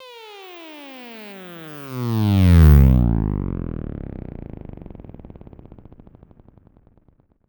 EAR DOWN, Anime Sound FX, SYNTHETIC

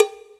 Cowbell; Equipped Music; Loungin House

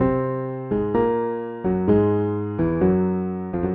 jazz keys AcPiano 128 B-line n Riff C

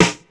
House Snare, Ensoniq ASR-X Pro

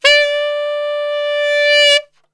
D 3 SAX, Brass Super Section; Best Service

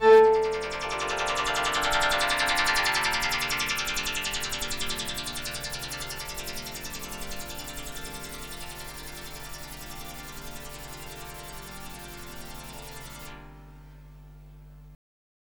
ambient pad; RTHM2PADD

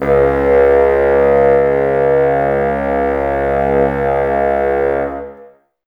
Bassoons C#2; Miroslav Vitous - Woodwind Brass Ensemble

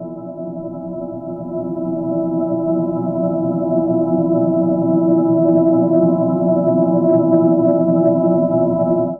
Ambient FX, Ambience FX

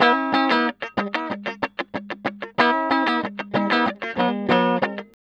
TELE DUAL F 3, guitar; TELE DUO; Spectrasonics; Hans Zimmer Guitars VOL-1

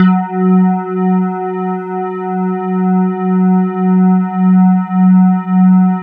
E-HARP, DX HARP; Wizoo - Powered DX

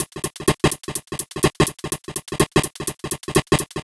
Disco Hats, Drum Loops 125bpm, Nu Dance Electro; Disco2Disco

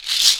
MARACAS LOOS; AKAI S-Series CD-ROM Sound Library VOL-5; SHAKER.MARAC

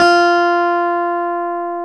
CLAV F4; Sonic Reality; Vintage Timetraveler; CLAV+PIANET

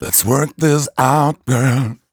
m 130 mel d-flat, Vocals

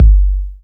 KICK, Hip Hop

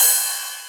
Ride, DL-909

